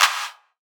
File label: 80s Clap